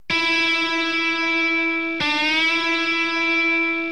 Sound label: standard bend, guitar; String bending